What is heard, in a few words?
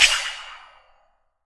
SHEET METAL, Definitive Percussion